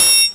INS TRI OPN, TRIANGL, Ragga & Dub Reggae

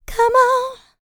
LICKS, Vocal R&B Dance; LT DANCE

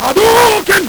LSDj, Nintendo Gameboy; 300 Drum Machines

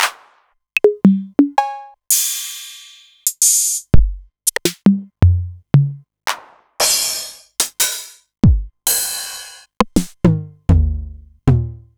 Novation Drumstation; DrumstationV1

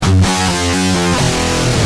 G Heavy, Guitar; Industrial

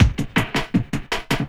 LOOP, 160 BPM, Drum & Bass

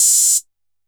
A#2 Analog HH Open, Yamaha PSR-E323

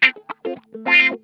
WAH, Funk Guitar; Zero G - Funk Guitar

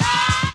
vocal

